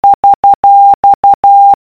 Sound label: Morse Code Dollar Sign